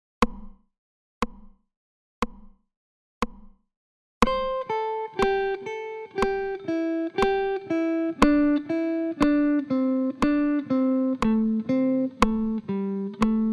Vers le grave; objectif solo 15 jours pour apprendre a improviser

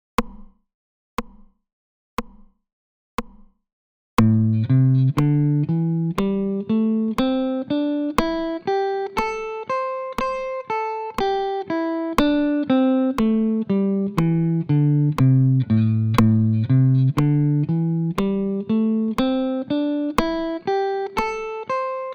Montée et descente 60; objectif solo 15 jours pour apprendre a improviser

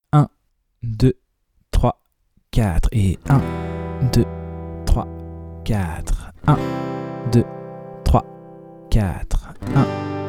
3 moyen Travailler la grille; Travailler l’enchaînement des accords sur toute la grille Em – C – G – D

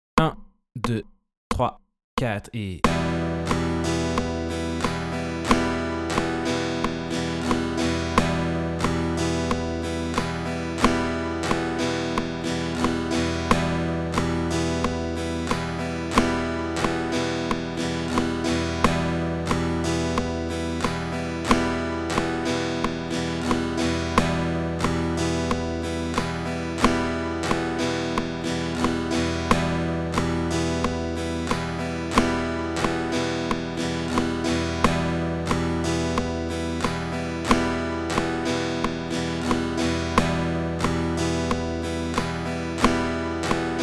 Rythmique classique 90

